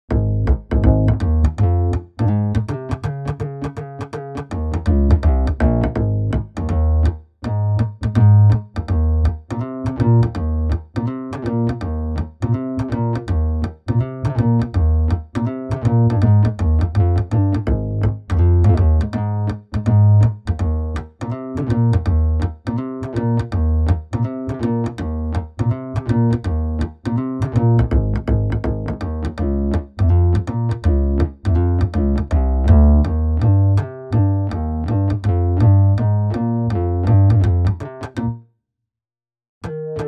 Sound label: Bass